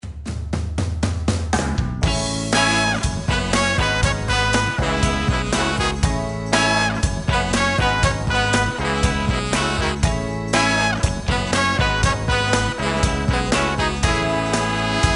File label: Stax-Motown, horns